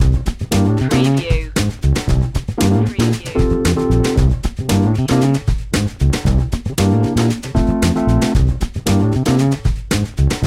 funk, Short edit for looping. Cool and Groovy funk track.; funky groovy